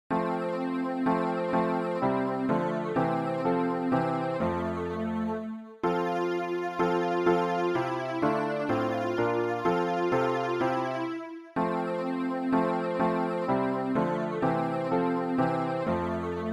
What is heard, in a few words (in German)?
alt, Übehilfen für das Erlernen von Liedern; ref gesangbuch; rg 247 grosser gott wir loben dich